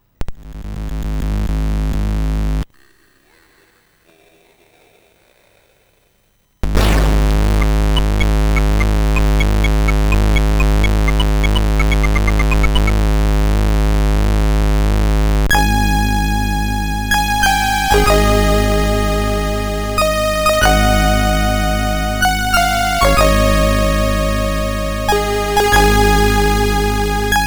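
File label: MOS 6581R4, Voice very quiet. "Staccato flute" at 1:16. SIDBench shows distortion on Saw+Pulse filter.; Week 16 1986 Hong Kong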